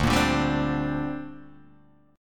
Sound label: D 7th Suspended 4th Sharp 5th, Guitar, Open G